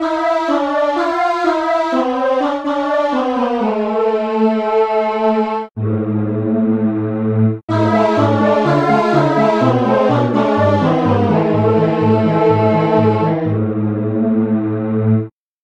goblin

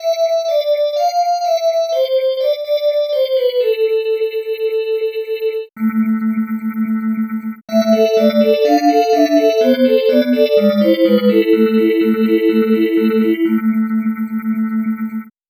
metal pad

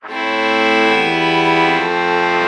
trumpet violin chord morph

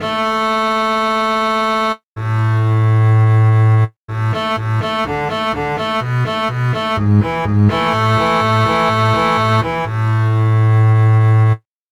contrabass

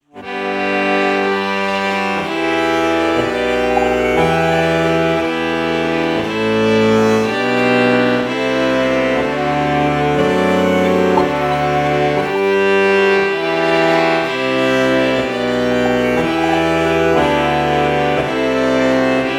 alto saxophone violin morph

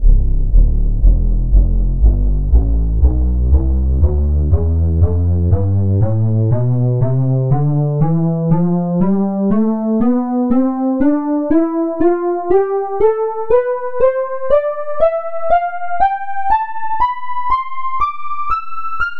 chiffer lead tonleiter

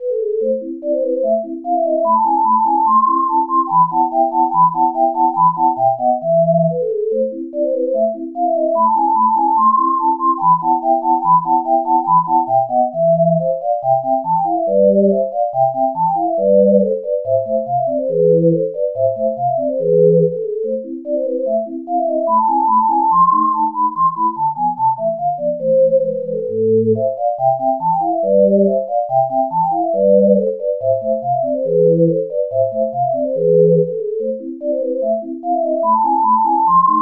whistle

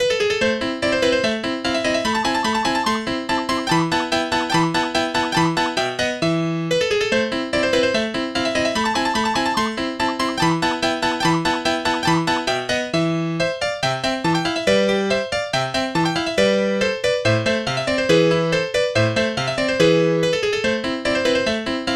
bright yamaha grand

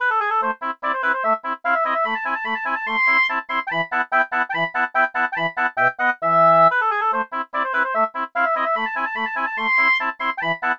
oboe

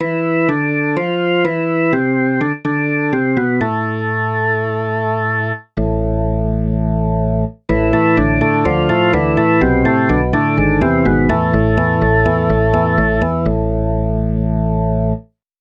rock organ

